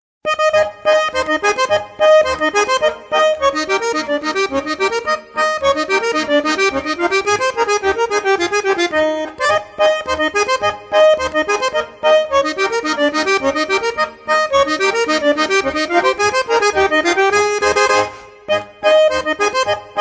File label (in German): Schottisch